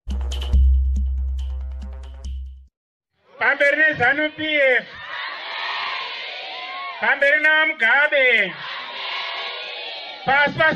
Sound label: chants; Midlands ZANU PF Vice chair